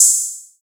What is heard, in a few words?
cymbal classic; Hip-Hop; Drum One Shots; SD CAICOS Hip-Hop Sample Pack; Slate